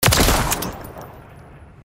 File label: hitmarker kill sound effect; mwiii